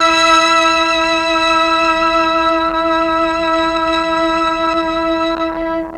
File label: MEL STR, Legendary M400